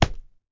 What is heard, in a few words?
collision